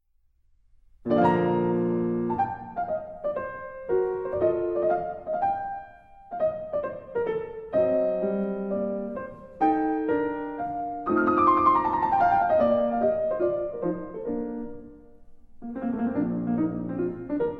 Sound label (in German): Sonate